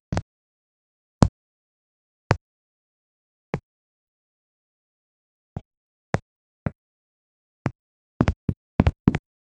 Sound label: a walking sound, 8 bit